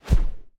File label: UI Error